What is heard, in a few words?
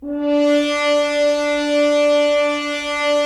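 Solo French Horn, brass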